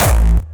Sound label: Growl Kick; osu